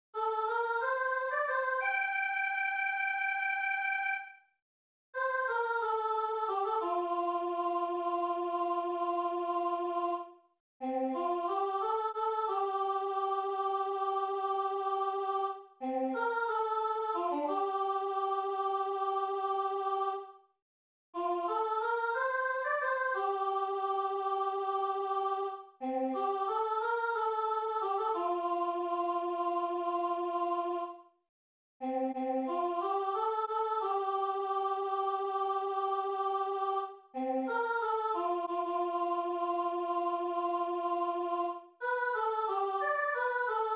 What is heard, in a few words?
music tenor; Si ca vous chante; mes 124 a la fin